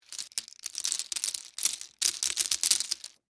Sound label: Debris Penne Rigate; Texture; Materials